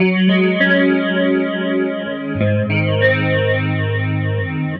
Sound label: Tele Guitar Loop